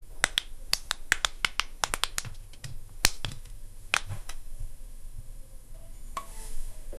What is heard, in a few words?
media clicking